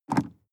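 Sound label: sports car interior pedal gas step medium; verkehr transport; autos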